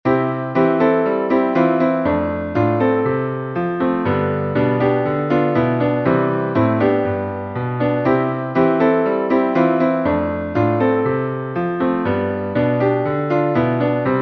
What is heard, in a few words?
see him lying on a bed of straw chords